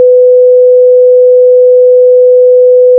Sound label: 3 sine